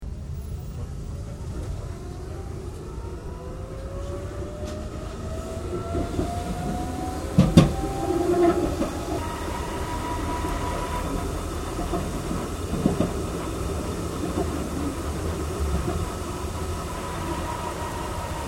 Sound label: Tram Melbourne Z3 Class Interior Track Start Stop